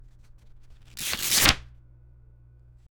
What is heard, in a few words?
paper being torn